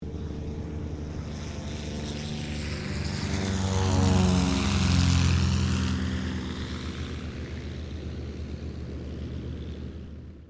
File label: AIRCRAFT CT4A TAKEOFF; CT4 military trainer aircraft; Airplanes